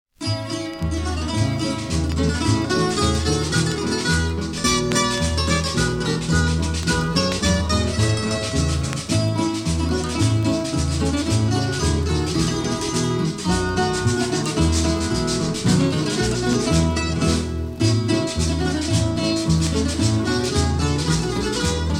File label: polka